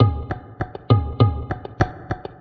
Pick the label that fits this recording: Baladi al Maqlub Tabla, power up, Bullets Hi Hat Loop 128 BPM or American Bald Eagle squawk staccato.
Baladi al Maqlub Tabla